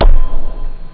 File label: bd bd; jazz jackrabbit 1; M8